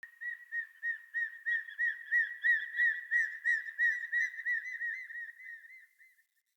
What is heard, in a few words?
Night Creature Medium; Night One Offs; Ambience